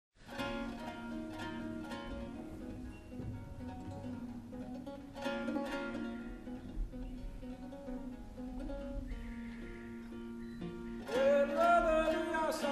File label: delivodeliasa